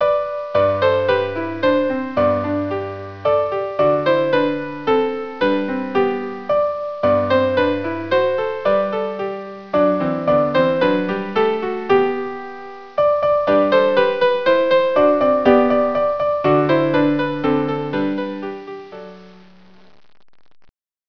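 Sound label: violin piano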